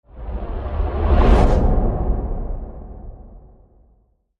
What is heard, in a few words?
Cityscapes Tunnel Flyby